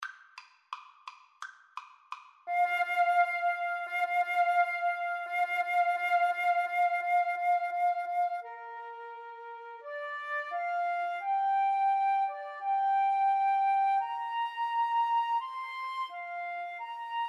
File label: flute duet